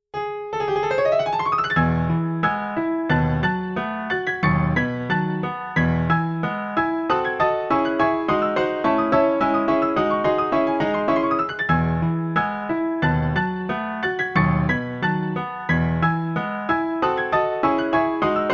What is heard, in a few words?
Hungarian Dance